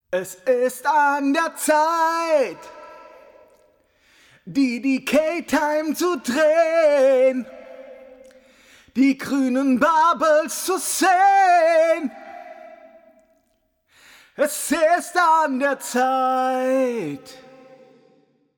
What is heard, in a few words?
Vocals smart reverb